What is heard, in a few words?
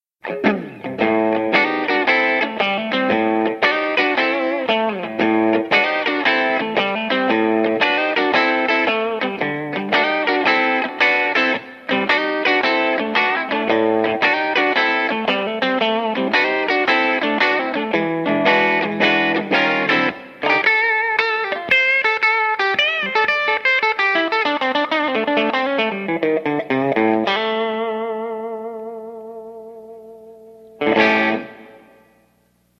Strat Hot; SampleAmpli; HomeGTR105